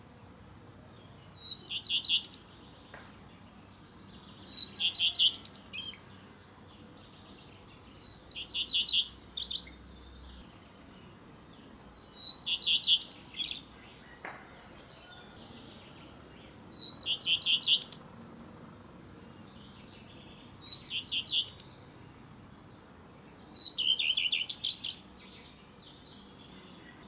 canto maschio codirosso phoenicurus common redstart colirrojo real; bioacustica

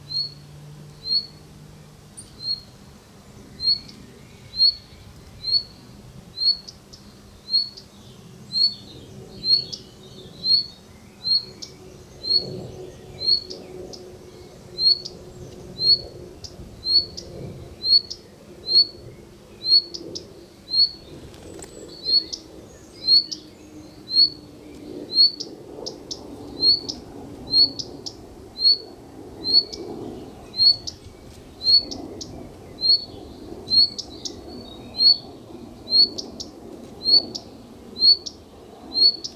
canto verso ui codirosso phoenicurus common redstart colirrojo real; bioacustica

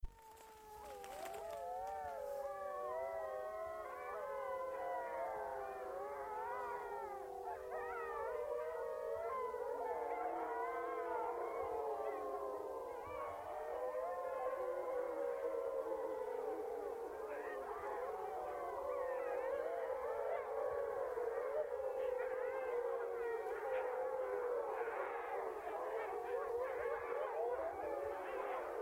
ululato branco lupo appenninico canis lupus italicus wolf wolves lobo loup

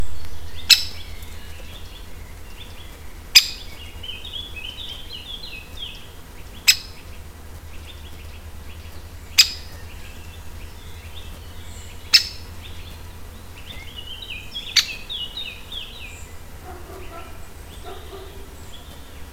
verso canto picchio rosso maggiore picoides major great spotted woodpecker buntspecht; bioacustica

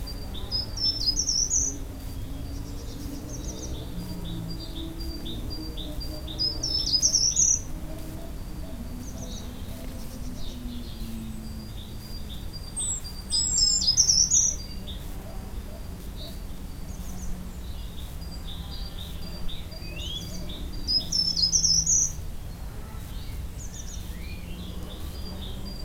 canto verso rampichino certhia brachydactyla short toed tree creeper; bioacustica

